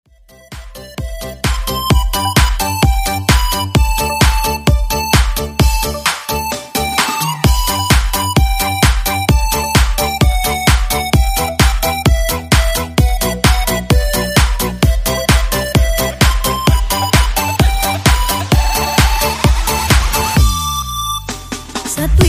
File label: 130 Bpm; Redrum